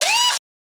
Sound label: vox